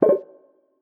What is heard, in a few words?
Menu Select; Martian Chess Audio